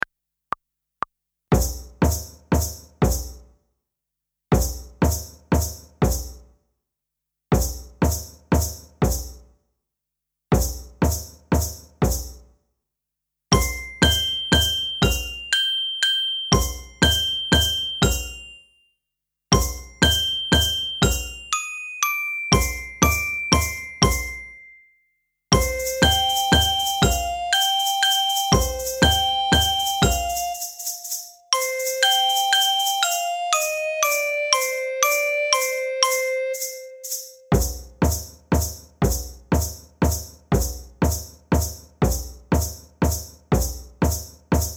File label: Group Piece without tabla